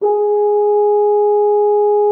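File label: French horn G#3; Xsample VOL-3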